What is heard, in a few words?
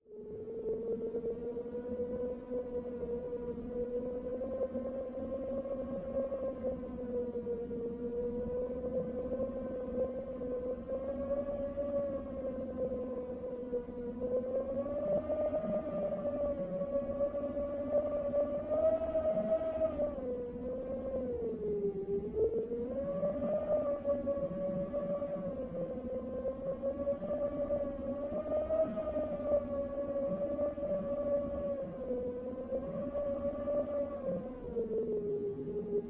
Spirits Background; This Is the Police 2